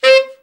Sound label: BRA TENOR SN, SAX; Best Service Gigapack 1; BR SAX 4